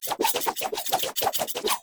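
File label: Dagger Projectile Whoosh; Weapons; Enemy; Daggers; Sinecure Dagger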